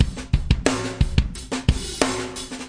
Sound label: gated hardsnare, 090 bpm, drum loop